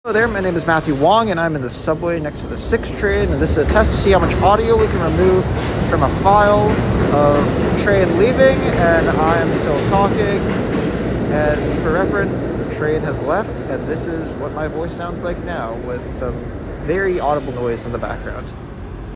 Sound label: Subway Test Recording